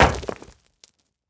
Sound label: concrete; Rust